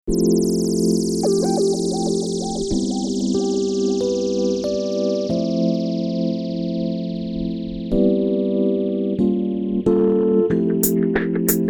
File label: Sax Jazz Mix